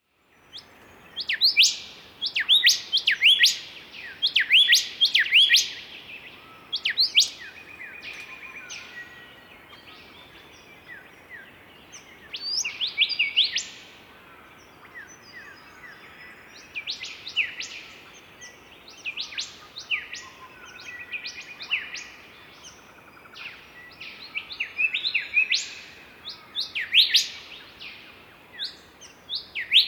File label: Golden Whistlers in duet; The Sounds of Recovery